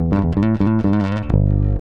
REAL BASS, BASSLICKS; Ueberschall; Jam Box

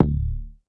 Bass; Big Fish Audio; Old Skool House

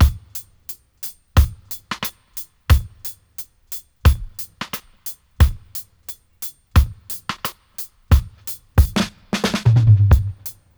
88 dry, drum loops, dub; Drumdrops In Dub VOL-1

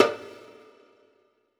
Dumbek; Essential Percussion

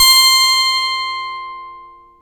DW8 CLV C6, Korg DW8000; Back In Time Records; Korg Universe VOL-1

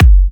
F#, Kicks; markus hakala

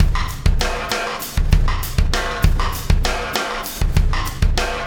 Wormhole Beat, Processed Drumkits

